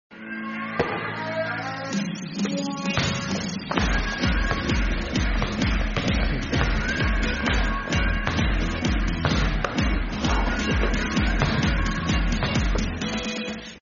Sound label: bgm; solvable-sheep-game